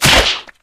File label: slap hit; tf